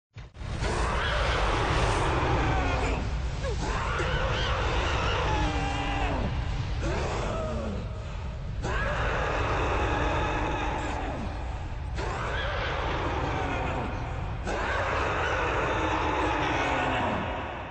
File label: horde roar